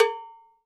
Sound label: Cow Bell Hit; Sonic Foundry (Sony Creative Software); Joe Vitale Latin Percussion